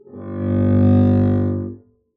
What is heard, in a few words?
arco g1 ff up, double bass